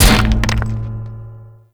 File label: Gatling Gun Debris Impact; Gameplay; Warframe; Tau